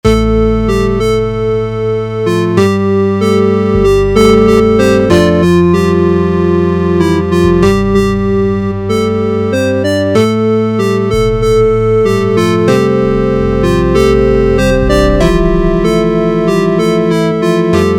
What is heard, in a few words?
Town Music Loop